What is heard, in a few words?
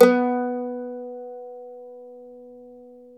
MANDOLIN